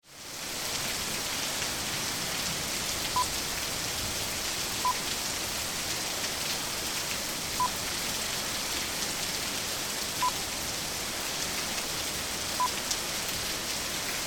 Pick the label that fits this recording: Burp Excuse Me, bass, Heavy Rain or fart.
Heavy Rain